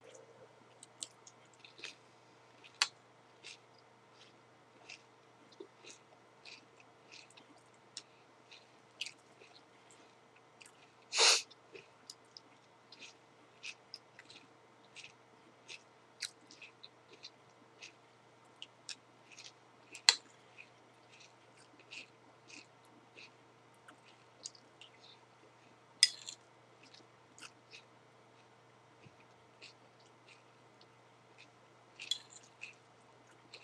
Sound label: random noises